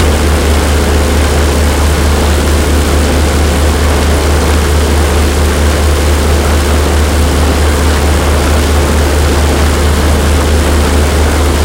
bomber side, engines; War Thunder

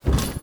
hipcutter foley walk

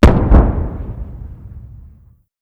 Vehicle Weapons; Red Orchestra 41-45

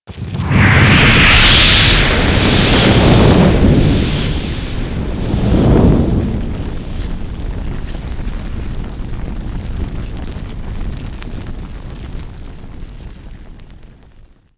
player ignite rear; estate; Call of Duty Modern Warfare

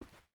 footstep boots cloth walk; Rust

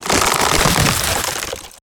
objects, World of Tanks

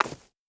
stag footstep grass hard; Rust

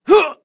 Elder Exert; english; voiceovers

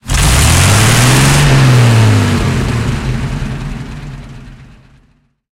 panther engine load, engines tanks; War Thunder

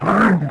Bear Die Vox; Civ4 warlords

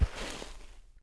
Animal Foot Large Snow; Civ4 warlords

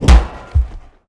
damage; World of Tanks

